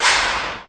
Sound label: zip shot, Alesis HR16 drum machine; DRUM MACHINES